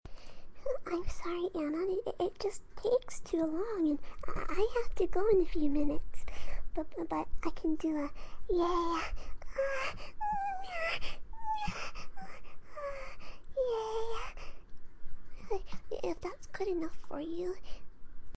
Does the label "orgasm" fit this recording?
Yes